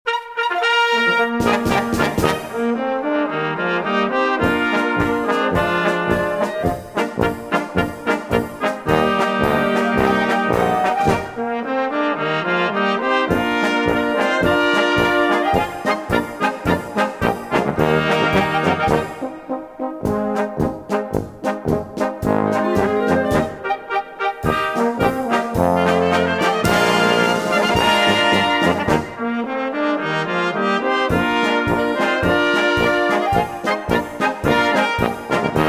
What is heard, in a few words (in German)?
Hey Jungs, spielt mal Polka